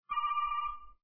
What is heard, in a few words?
Windows XP Ringin